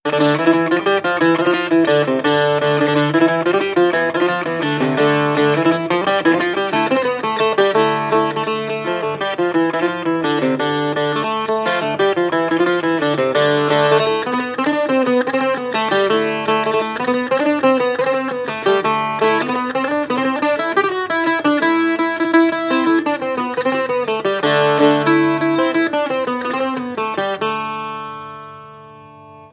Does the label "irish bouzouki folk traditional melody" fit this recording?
Yes